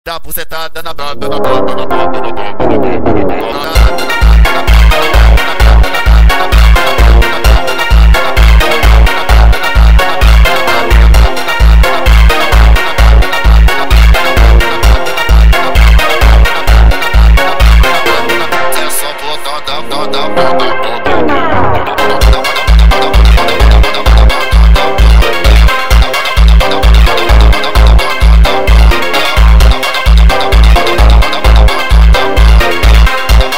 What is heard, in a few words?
Phonk Montagem